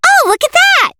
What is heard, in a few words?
Throw; BBTAG